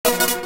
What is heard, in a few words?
Notifier; BlackBerry OS-4.6; Caffeine